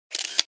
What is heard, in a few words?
camera sound; Alcatel OneTouch V985N